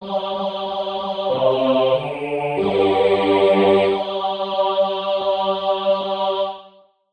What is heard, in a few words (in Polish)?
Bas solo; Alexander Messe Modlitwa wiernych